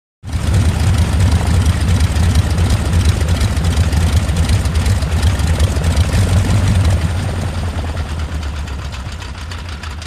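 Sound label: Idle / Off Interior; Sputtering Idle, Throttle And Shut Off With Slow Wind-down. Prop Slowly Spins To A Halt. Close Perspective. Prop Plane.; Broussard M.H. 1522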